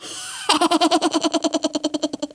LAUGH, Polish; 1997 MMX LAN PACK (win 95); Worms Armageddon

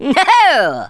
jump, Speech, Thespian; Worms Armageddon

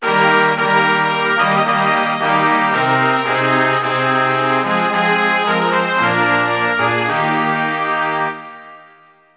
Luxembourg, Fanfare; 1997 MMX LAN PACK (win 95); Worms Armageddon

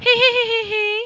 laugh; Worms Armageddon; Greek

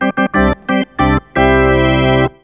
Classic organ, Fanfare; 1997 MMX LAN PACK (win 95); Worms Armageddon

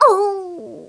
OOFF, Polish; 1997 MMX LAN PACK (win 95); Worms Armageddon

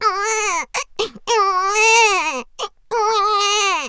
You babies, Fanfare; 1997 MMX LAN PACK (win 95); Worms Armageddon

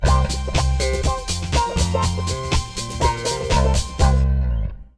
Disco King; Fanfare; 1997 MMX LAN PACK (win 95); Worms Armageddon

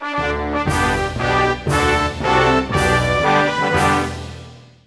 France, Fanfare; 1997 MMX LAN PACK (win 95); Worms Armageddon

